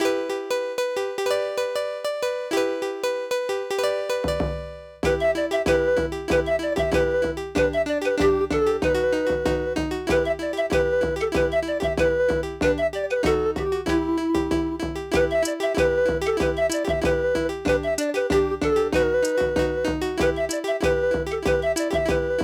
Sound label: Aircheck, Retro